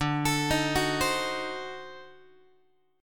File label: D 7th Flat 9th D down Guitar; D7b9 arpeggiated; JGuitar